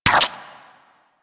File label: Inf Punch Life Steal C; Warframe; Weapons; Infested; Inf Kick Punch